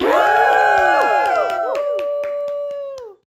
audience woo women

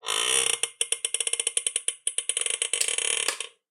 Chair Squeak; SoundsCrate

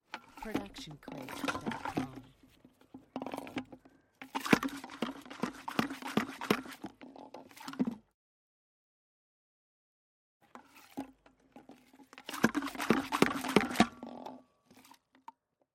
golf ball hand washing machine mono

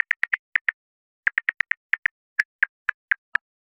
bat

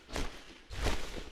open wings; soundscrate

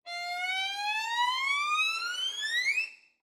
Violin Slide Up; SoundsCrate

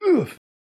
man injured grunt; soundscrate

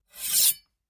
heavy sword sheath

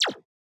scifi weapon synth blaster; soundscrate

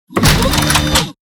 Robotic Movement; SoundsCrate

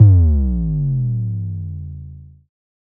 Bass Drop; SoundsCrate

